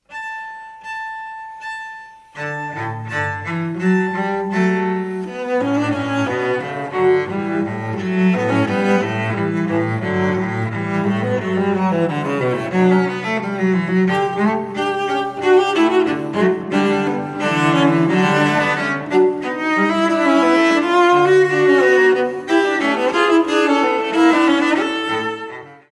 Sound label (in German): celloduo; hofmeister verlag; ging heut morgen durch das feld